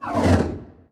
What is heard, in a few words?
death horse whoosh